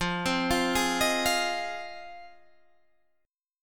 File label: Ab 6th F